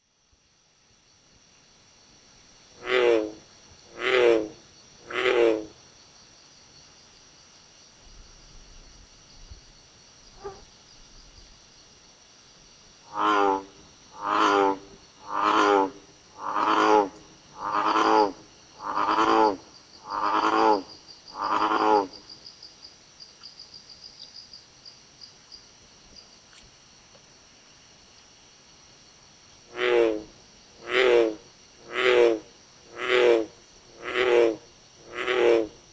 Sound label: frog, Call a low-pitched "bellowing bull"; Lithobates catesbeianus